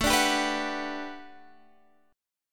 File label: A 13th Suspended 4th A, guitar chord